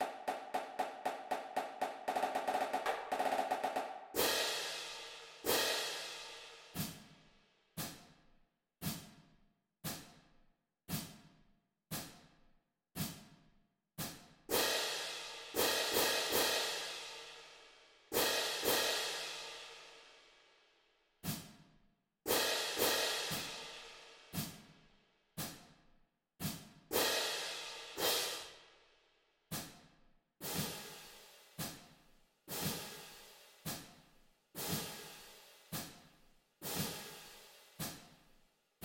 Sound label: cymbals, drumline